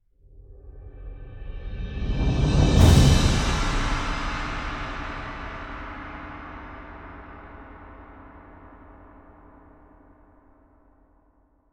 Full Orchestral Percussion Hit

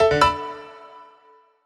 Golf Shot; Switch 2

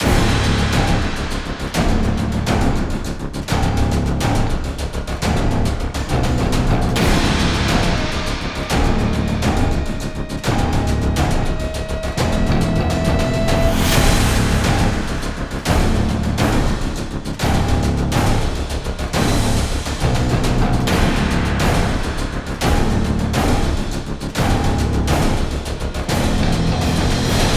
Base Loop low

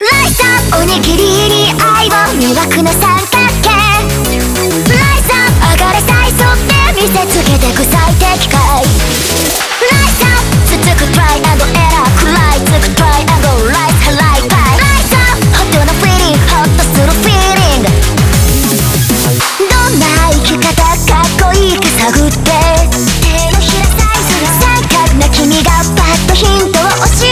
BGM Reach

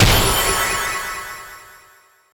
Rose Queen Active Motion Clover

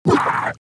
Boss Lite Sloppyroar; The Binding of Isaac

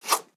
Swipe; Joblin

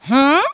Hm

